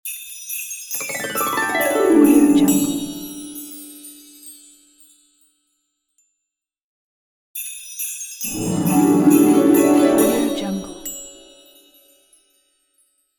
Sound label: christmas magic; sviridpavlov